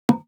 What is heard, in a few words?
Miss; Blind Quest - The Frost Demon